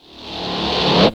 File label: GTR, E.Guitar FX; Roland LCDP02 Guitar and Bass